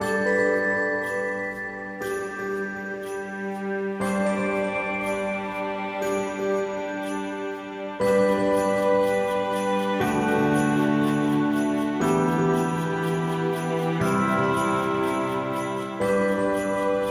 Sparkling Ambient

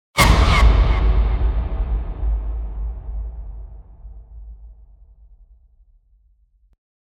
Terror Strike; Gothic Storm Music

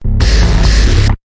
Blender Tonal; Ninja Tracks

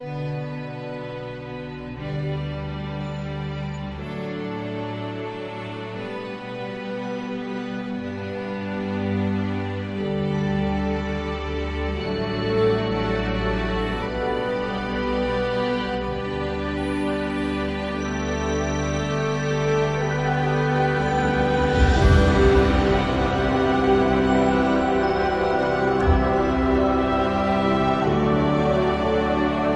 Graceful Ambient

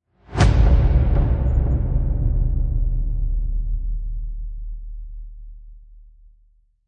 Twin Bounce Whoosh; Gothic Storm Music

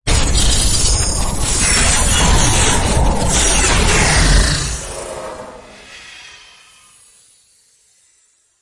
Storms Stinger; Gothic Storm Music; Advanced Sound Design 2012

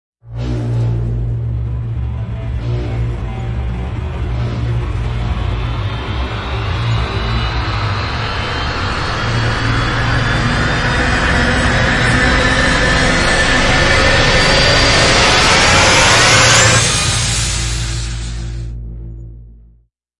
Grist Rise; Ninja Tracks

